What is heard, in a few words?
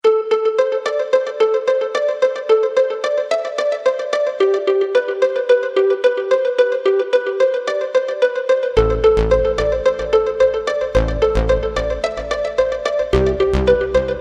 electro space